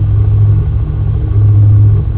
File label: drone atm